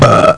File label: burp; Soundsation; Human